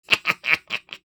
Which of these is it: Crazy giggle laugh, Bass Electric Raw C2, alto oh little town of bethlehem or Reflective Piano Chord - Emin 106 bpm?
Crazy giggle laugh